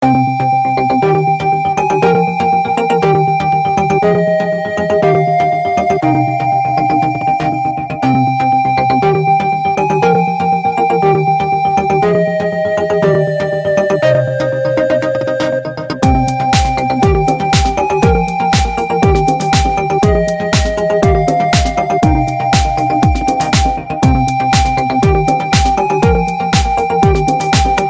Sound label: Trance; pulsating rythm